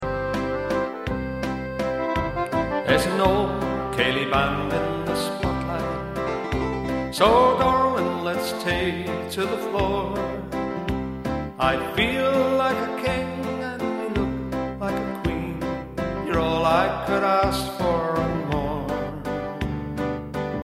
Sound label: waltz